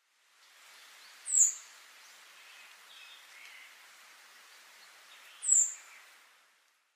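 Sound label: Grey-backed Thrush; karaakahara; sakata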